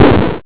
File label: damage; glitch in the system